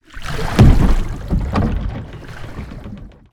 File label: arrive shore